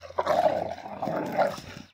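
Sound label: newfoundland, dog growling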